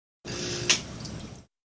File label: HOSPITAL EQUIPMENT DENTIST: Dental x - ray machine exposure.